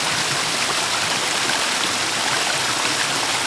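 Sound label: water fall, ambient; Shadow Man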